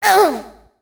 pain; En lizwiz pain3; Fight Knight

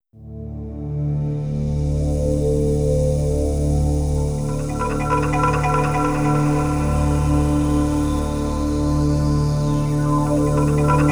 Trance Version; The Legend of Zelda: Ocarina of Time; Forest Temple

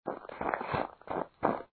Ice Steps; Fireboy and Watergirl

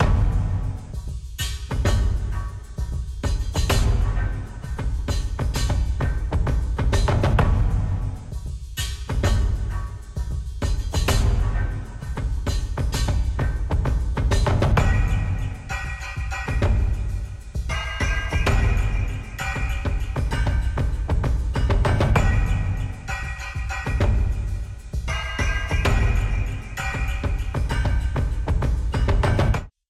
Mus Action Perc Low 130

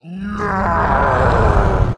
Warcry

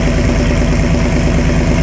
Ford F150 Idle; Carsim

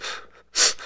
Fatigue Light; 1916 der unbekannte krieg gamerip

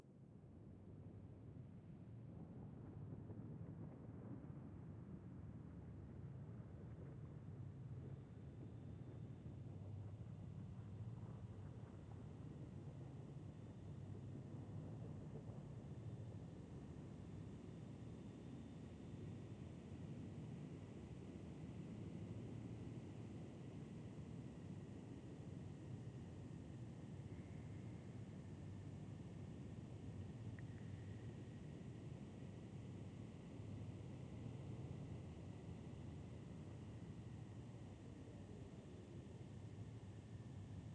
Down Ambience

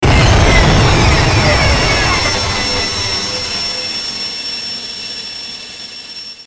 Powerup Stunts; NASCAR Racers

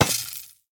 fuse drop

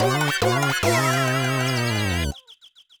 Jingle You Lose!!; bomberman 2; nintendo ds

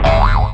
Missing Gems; Marble Blast Gold

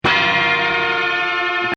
Aggro Agg stab gitaar; Outdoors Old

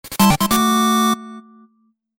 Get Weapon Fanfare (8-Bit)

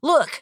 look; devour

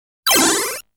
Laser in barrier state

